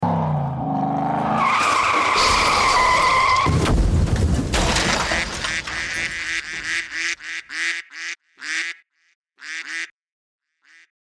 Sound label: car skid crash; Wind in the Willows. Amateur production. Sound effect of Toad driving off after stealing car.